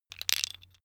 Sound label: tiles wood